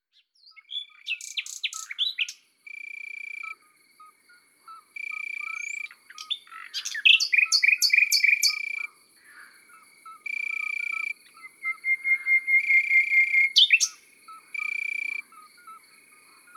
Rossignol philomele, Chants d oiseaux dans la campagne

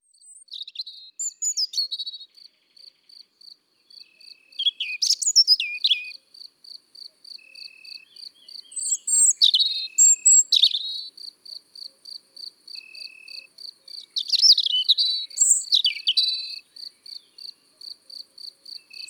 Rougegorge familier Chants d'oiseaux dans la campagne

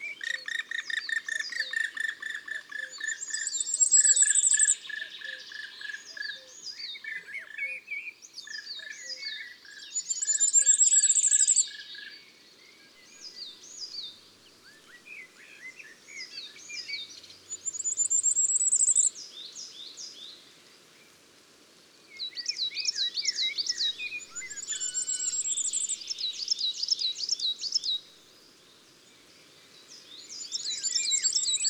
Pic noir, Chants d oiseaux des bois et des forets

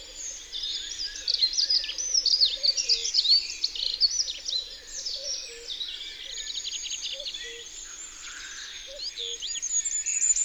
Accenteur mouchet; Isere; Concerts naturels de l'Isere; Studio Les Trois Becs

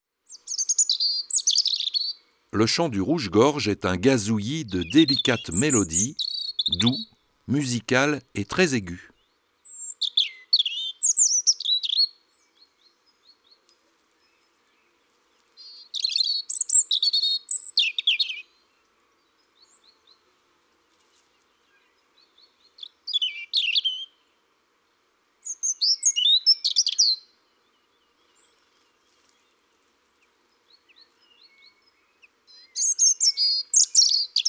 Rougegorge familier; oiseaux des jardins

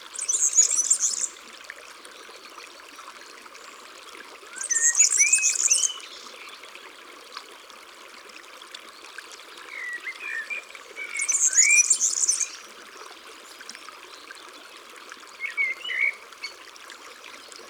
Serin cini, Chants d oiseaux dans la campagne

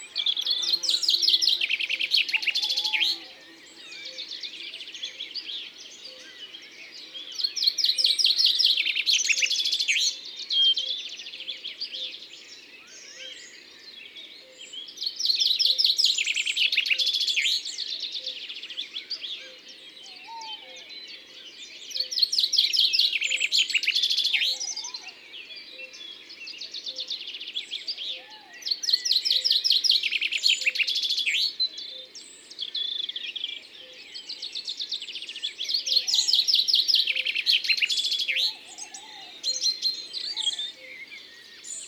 Pinson des arbres ABC des chants d'oiseaux

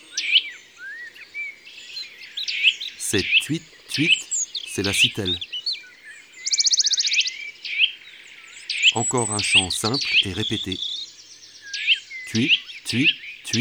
Sittelle torchepot, ABC des chants d'oiseaux

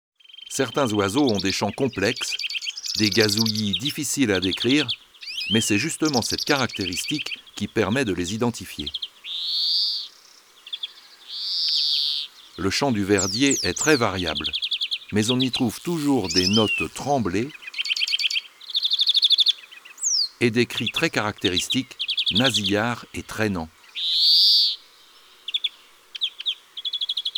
Verdier d'Europe, oiseaux des jardins

